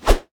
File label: Sword Whip; Weapons; 1001 Sound Effects